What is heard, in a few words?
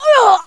pain; invis